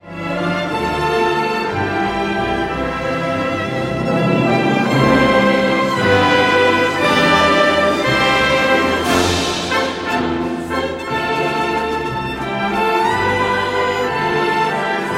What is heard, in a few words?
waltz